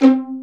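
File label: pizz violins; E-MU Formula 4000 Series Vol. 5; Protozoa; Proteus2 Presets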